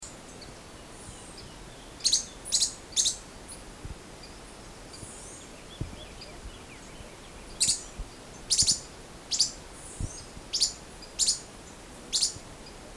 canto do arredio palido

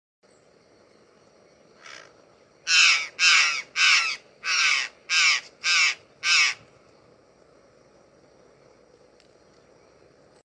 canto da gralha de nuca azul

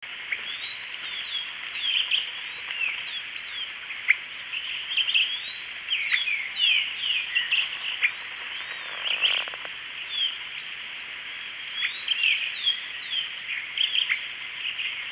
canto do sabia de oculos

